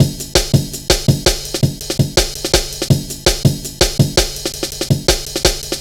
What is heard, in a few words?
going surfing, Drum Bass Drumloops 165bpm; Zero-G; Total Drum Bass